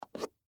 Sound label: Game Piece Up, Metal, Pick, From, Board; Sports; Monopoly; BlastWave